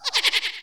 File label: pet skeleton; mir2; crystal